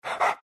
pant small, greevil; npc; courier

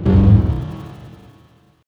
impact; immortal; phantom lancer

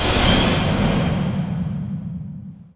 natures guise; treant

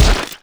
melee attack impact; weapons; dota; creep

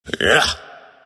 grunt; dota; ember spirit

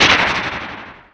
horn toss cast; weapons; dota; magnataur

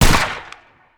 attack; dota; muerta

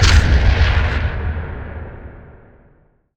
starstorm cast; weapons; dota; mirana

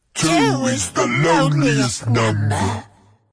death; dota; ogre magi

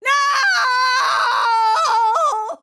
death; snapfire

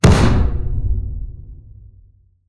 concussive grenade; weapons

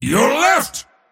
move pain; dota; mars

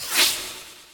preattack; dota; disruptor

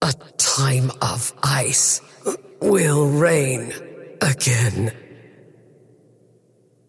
wolf death; dota; crystalmaiden

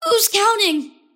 lasthit pain; kidvoker; dota; invoker

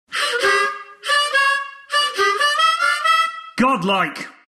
godlike harmonica; announcer; killing spree; dota; announcer dlc

